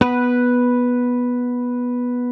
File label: Hamer 12, SUS, B3, Bass; Sampleheads; Will Lee Bass Library